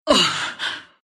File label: pain, ru; dota2